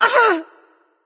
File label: grunt hit light; smite; voicepack; hel